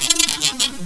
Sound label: INSECT, WEIRD